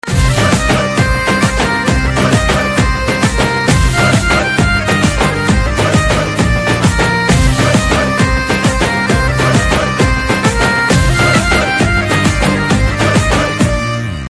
Instrumental Tone Telugu Ringtone

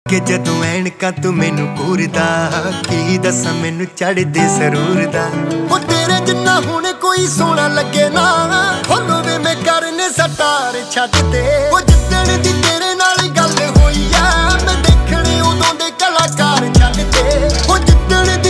Love Punjabi Ringtone

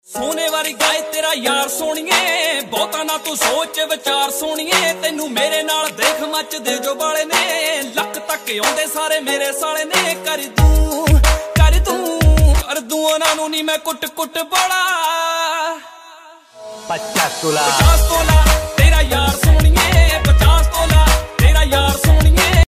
Popular Punjabi Ringtone

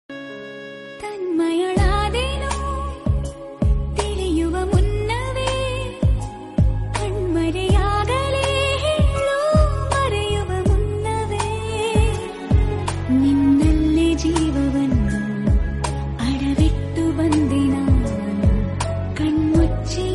Kannada Movie Ring Tone; Paramatma

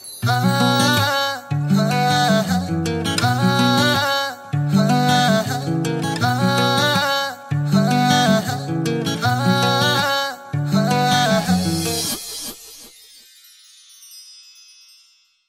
Punjabi Ringtone

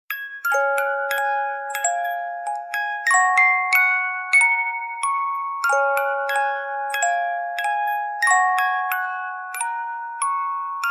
Cute Tone Ringtone

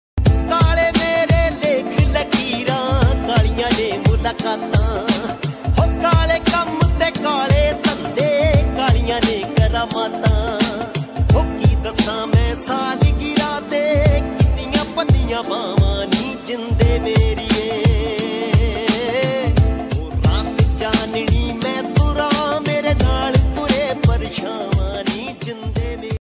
Punjabi Tune Ringtone

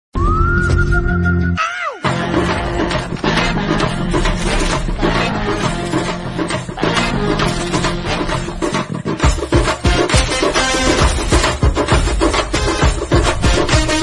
Tamil Ringtone; Jagame Thandhiram

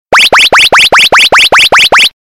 Corto Warning Tone Ringtone

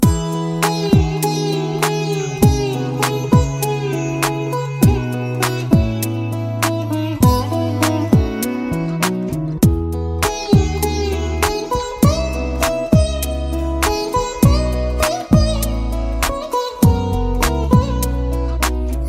Instrumental Tone, Telugu

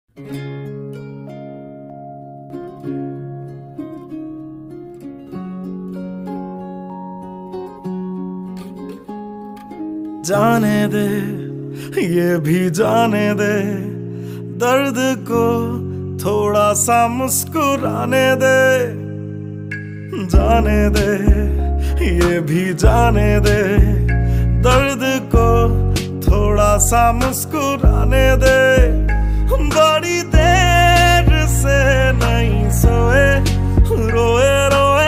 Punjabi Beats Ringtone

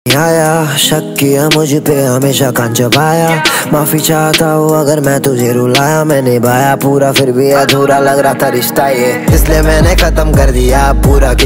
Rap Ringtone

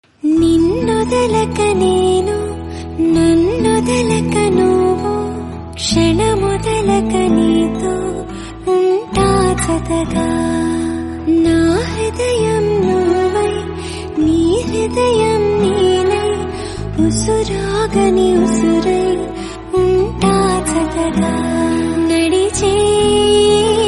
Telugu Song Ringtone; Roberrt

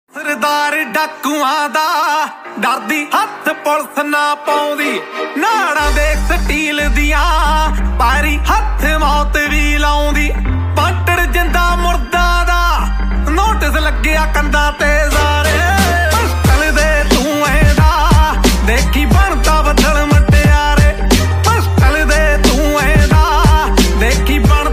Punjabi Ringtone

